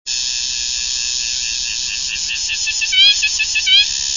cigala

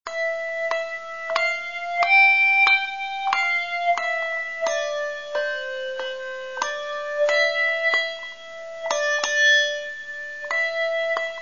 campana; Campanes; So determinat

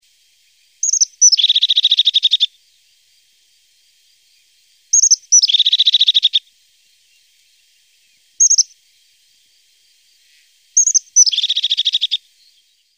mallemplomallada, Cants; Mallerenga emplomallada (Parus cristatus); ocells

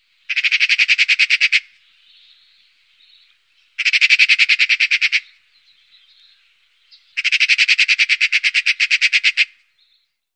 garsa; Pica pica

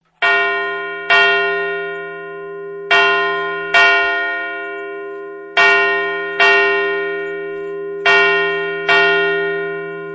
a clase, campanars tocs

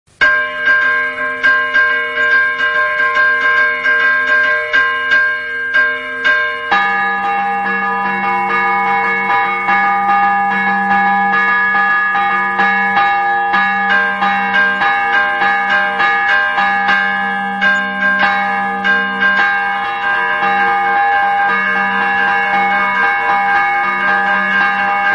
a repicar, campanars tocs

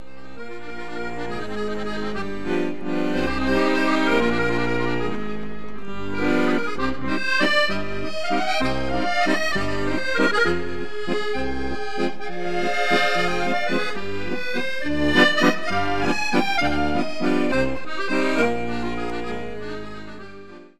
acordio, instrume

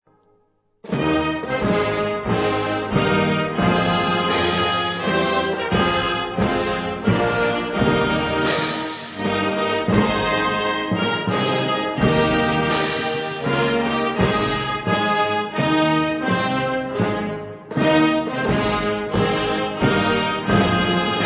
himne usa curt

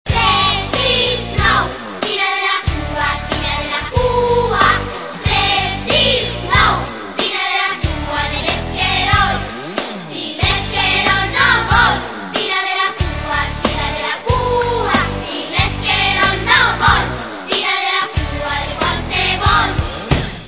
esquirol, canзons infantil

